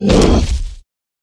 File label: troll attack bottom